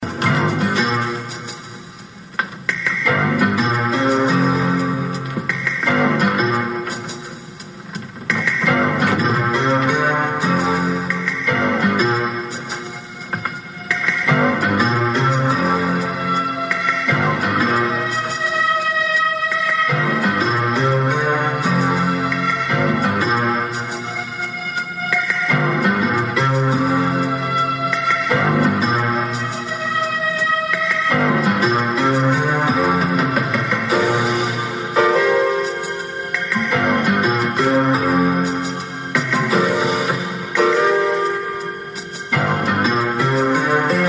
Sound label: suspense theme